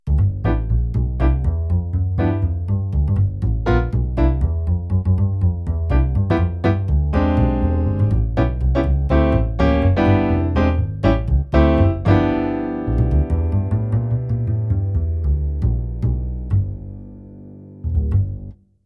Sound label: kawai mp11 piano